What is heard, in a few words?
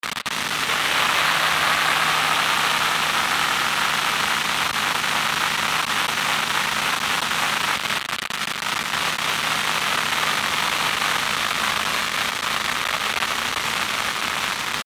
Bed Static Crackle; Bed Static Crackle Hard; Bed Sound Effects; Blastwave; Production Element Imaging Element Accent Transition